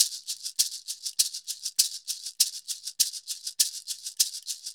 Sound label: Cabasa 100 bpm; Drums & Percussion; Best Service; Festa Latina VOL-1